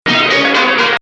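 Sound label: Bayati Husseini Rast low, Arabic music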